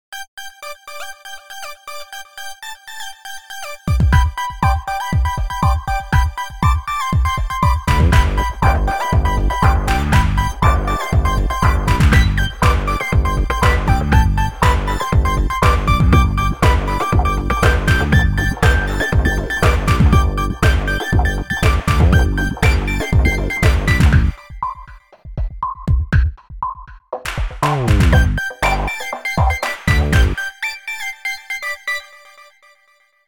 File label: Electronic